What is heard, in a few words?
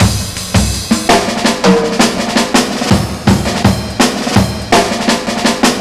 big jazz solo